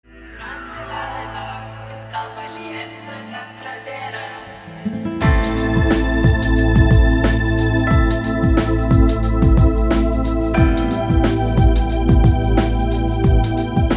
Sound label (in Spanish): la guitarra